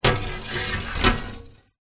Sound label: foley large glass loose panel scrape movement on glass